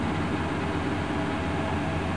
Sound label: drill sound; PLANET X